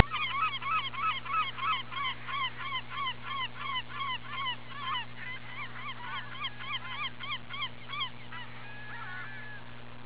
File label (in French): le chant des mouettes